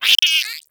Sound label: egg pain; Portal 2; eggbot